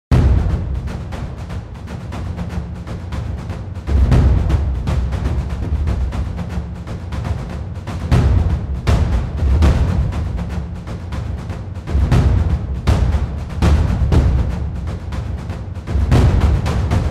Drums Chasing Accents 16th feel; Colossus

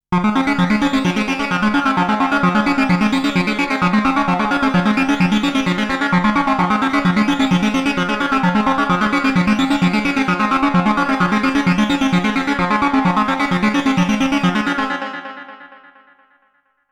Synthesizers A1 Arp Reverb Delay

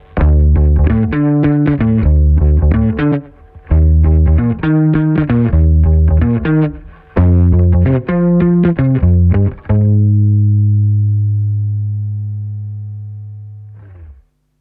Bass

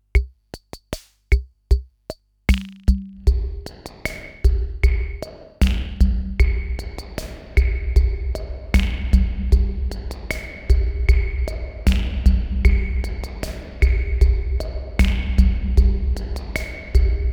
Korg Minipops In Gain

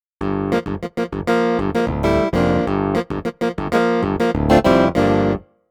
Roland Clav

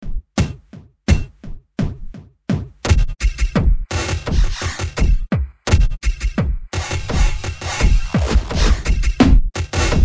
Kit; Exile

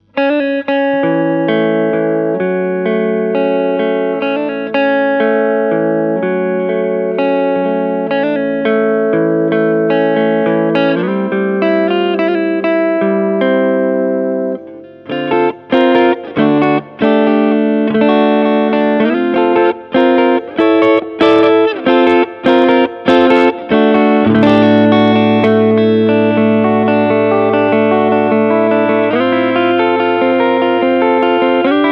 Music Man Mariposa clean neck

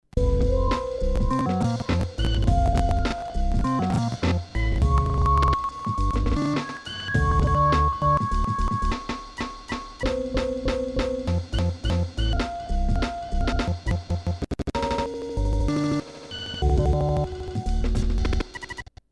Numark v7 repeater

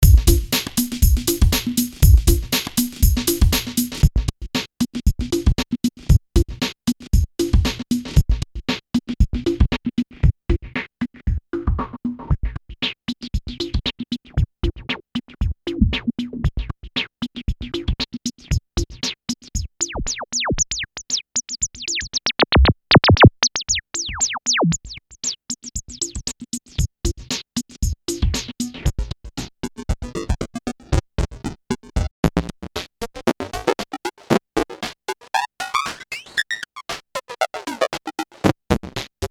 Korg EA 1 Audio In

